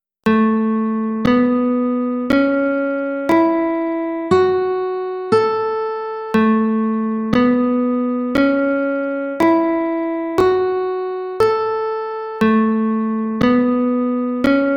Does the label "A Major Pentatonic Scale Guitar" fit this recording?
Yes